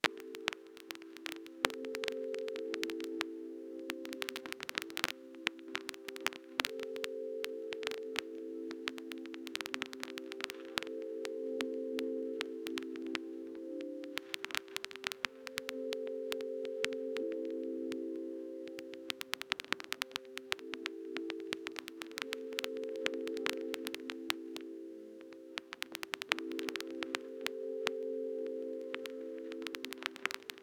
crackling noise of an old, vinyl

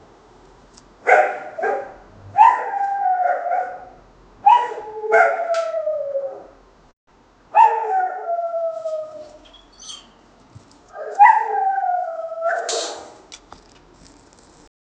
distant dog barking wind; animal

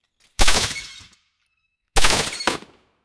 birds fly bark meaun slap

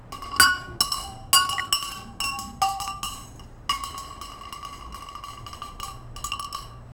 workers are working, workplace

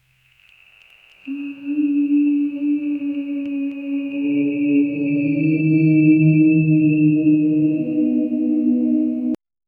generate a mystical fox

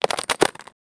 the user clicks on a, treasure

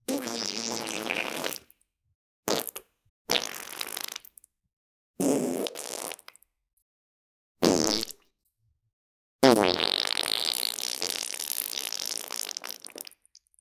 wet sticky bubbly farts, body; sound effect

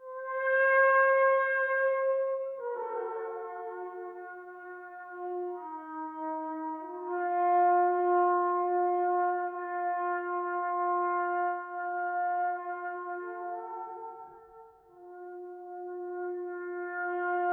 french horn trombone euph; chase

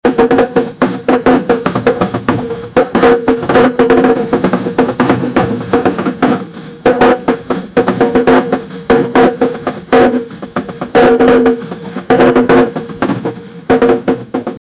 ukrainian lo fi beat

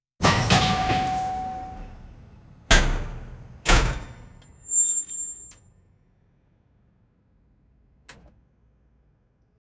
the sound of a flexible, industrial

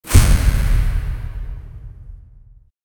create a sound effect for, angelic

